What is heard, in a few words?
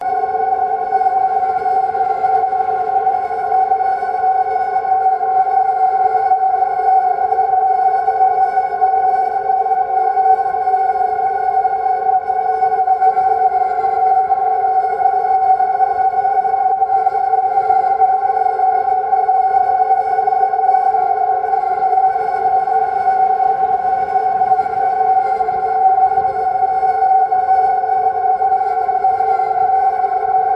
space drone; Audio Mangler